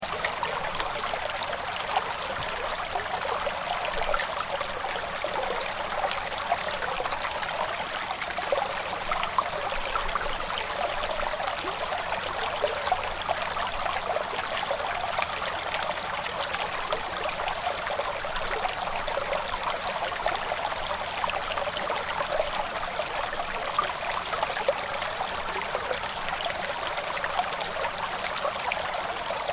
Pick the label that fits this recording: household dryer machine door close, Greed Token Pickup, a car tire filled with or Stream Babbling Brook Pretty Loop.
Stream Babbling Brook Pretty Loop